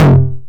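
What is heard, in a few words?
909 high tom tape saturation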